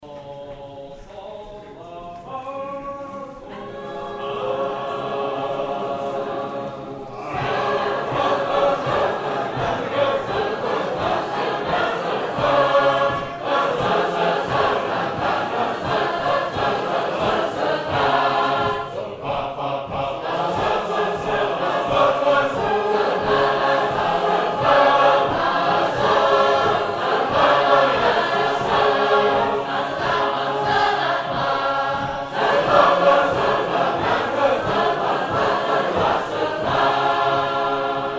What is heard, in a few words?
Manchester, sacred harp